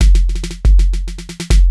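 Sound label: DRUMLOOP ELECTRO 140; Techno2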